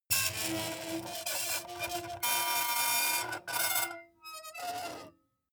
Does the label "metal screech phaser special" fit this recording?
Yes